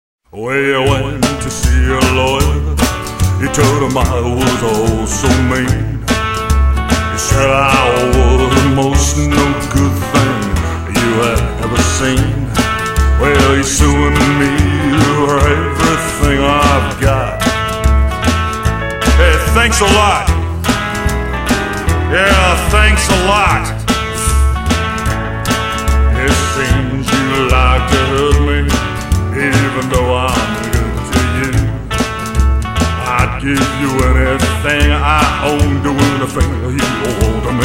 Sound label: ROCKAB, rockabilly; Rock’n’Roll